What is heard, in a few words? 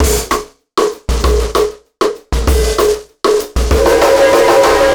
Beat, Processed Drumkits; Event Horizon